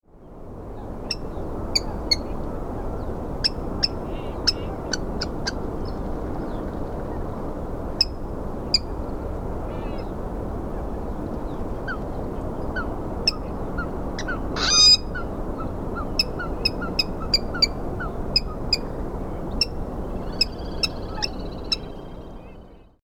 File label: kuina tunnel